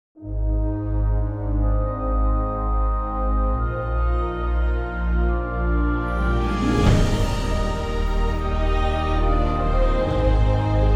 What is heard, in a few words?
TV Opener; Cineastisch